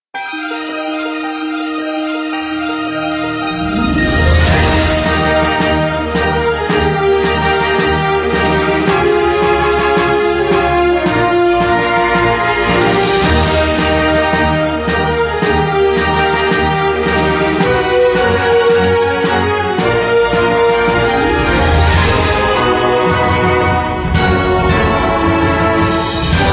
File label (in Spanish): Musica Cinematica de Super Heroes